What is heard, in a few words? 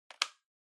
Portable MD Controller Plug In